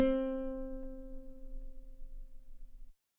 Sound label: guitar C4 very long forte normal; bananenkeyboard